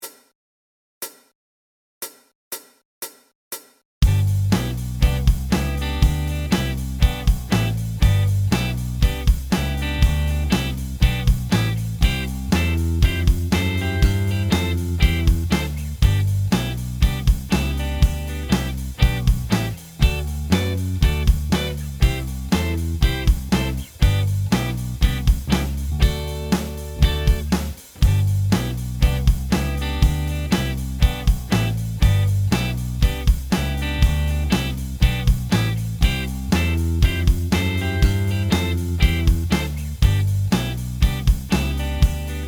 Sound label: Rock n Roll Beat